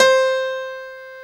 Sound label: PNO, Electrics, Grands; Club-50 - Foundations